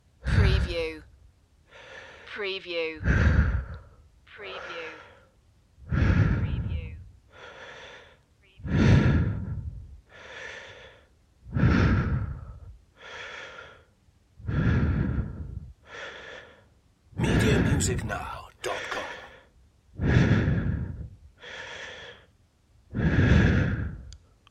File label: Breathing